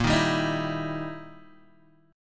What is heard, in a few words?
E Minor Major 7th Flat 5th Bb